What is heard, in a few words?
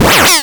Arcade Game Laser Shot Button Sound